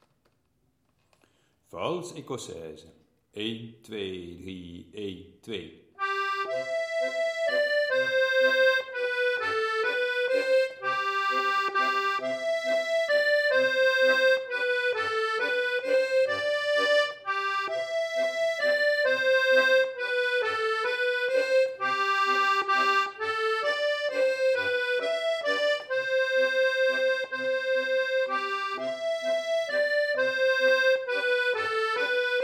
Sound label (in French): Valse ecossaise